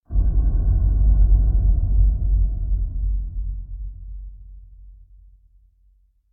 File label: Impact Dull Sub Metal Hit (Deep Distant Bell); Hollywood Edge; sound designer's toolkit v2